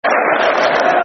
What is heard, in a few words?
Football Chants; Darryl Powell Is A Football Genius; Derby County Dcfc